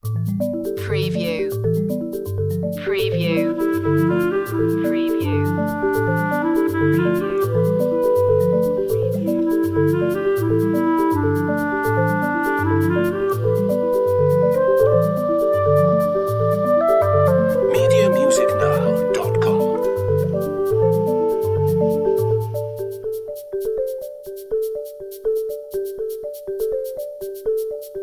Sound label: mellow marimba